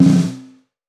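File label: Black Brass Short Drag, Snares; Drums; Ghosthack - Free Snares 2023; Blk Brass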